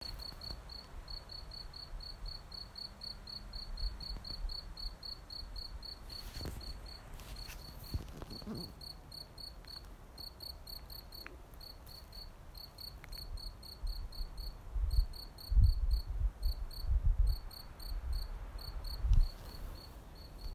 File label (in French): Débarquement de grillon